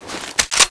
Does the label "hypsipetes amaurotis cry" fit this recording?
No